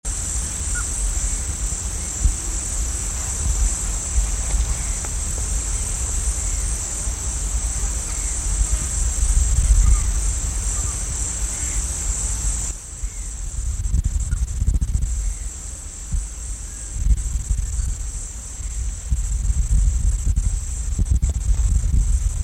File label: long tailed ground dove